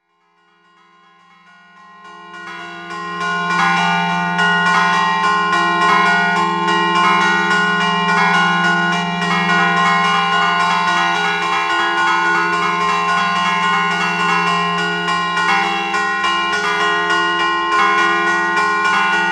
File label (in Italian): campane; Mario Bonalumi, di Paladina (Bergamo) nato nel 1928. Marcia veloce per due campane; del Santuario di Sombreno; Paladina, 3 - 4 - 1988. Registrazione di Valter Biella